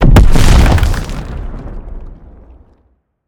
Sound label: impact; smite; cthulhu